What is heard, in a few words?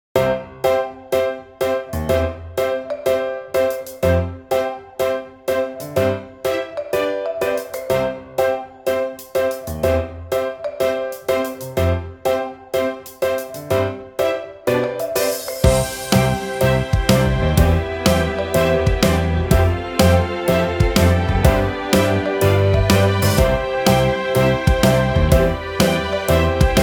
Band